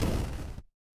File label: retro game explosion